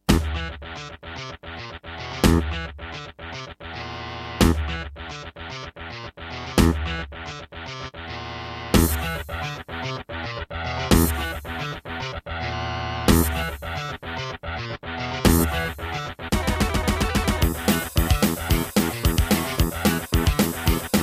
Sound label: Aztech CS9233 DB; Doom